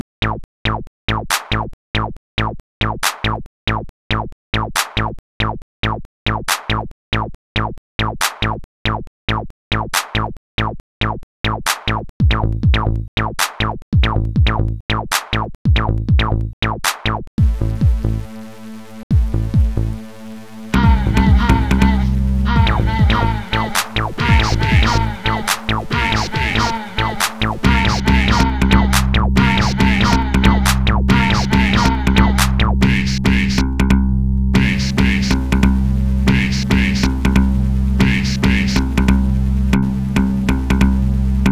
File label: techno